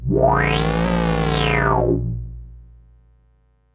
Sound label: Odd Modular; radiosonics